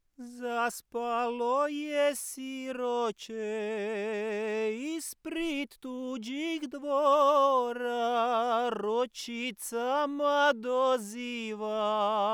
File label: klapa, Croatian Folk Singing